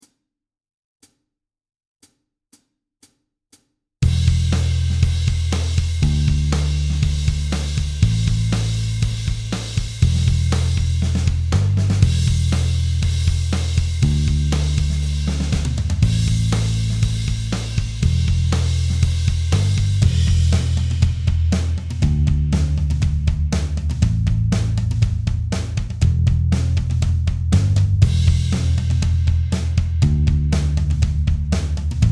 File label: No Guitar